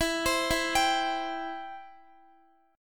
E Minor Sharp 5th E down Guitar; arpeggiated; 4F,4A,5C,5G,5C,6E

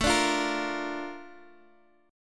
Bb Major; Bb/A strummed; JGuitar

